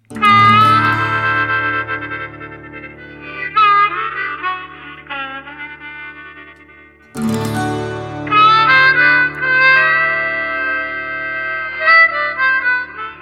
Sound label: Blues